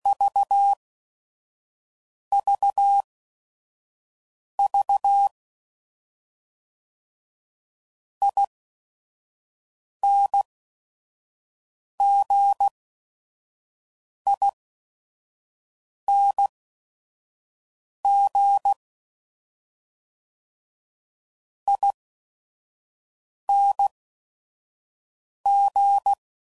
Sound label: Dble ING's; Morse Code; Suffixes; Special ING's; HamRadio; CW Code Groups 5WPM; Mixed